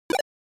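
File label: Game Boy General Pitch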